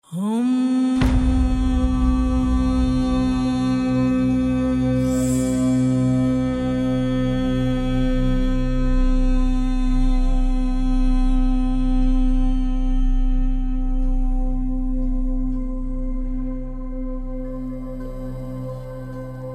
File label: 432 Hz; Shamain